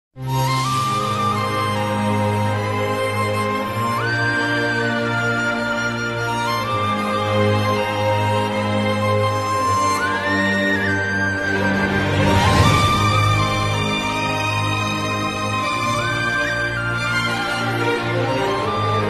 bgm instrumental ringtone malayalam ringtone; devadoothan movie

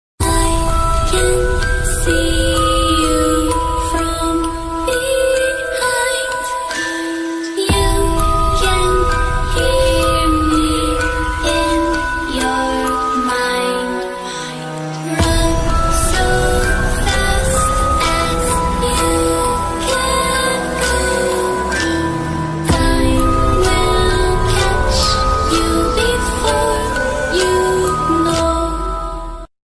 i can see you ringtone best horror ringtone; popular horror ringtone

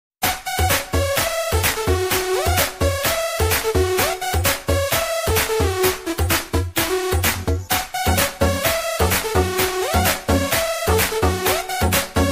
dj remix disco music

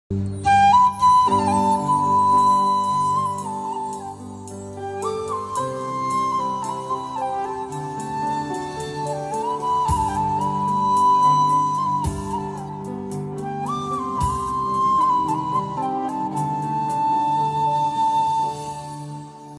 flute music; omg

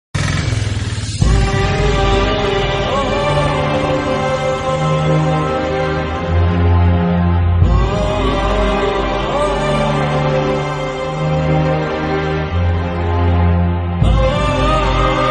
movie bgm ringtone; bichagadu

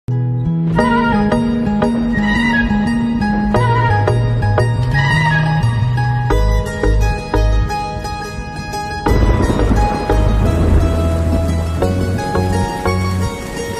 instrumental beat ringtone

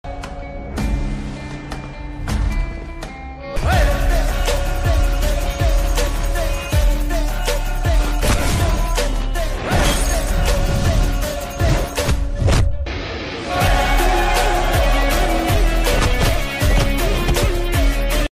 movie bgm ringtone background music; Ruslaan movie

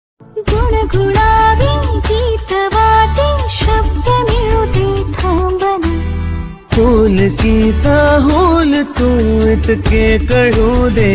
new marathi ringtone

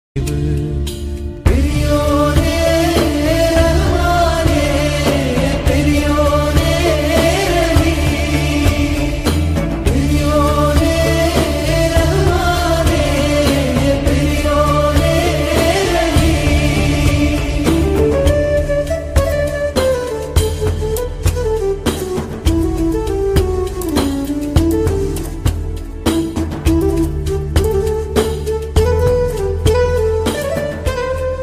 ringtone bgm new trending ringtone; the goat life aadujeevitham

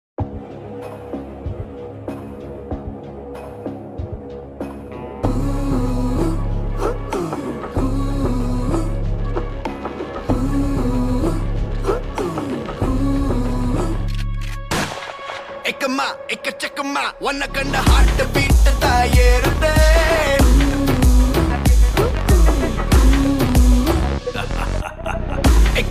song bgm ringtone; vettaiyan; rajnikanth

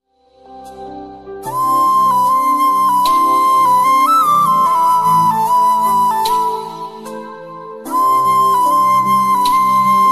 flute music ringtone romantic flute ringtone

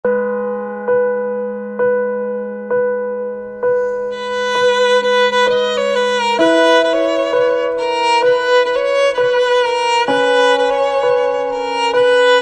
instrumental ringtone bgm

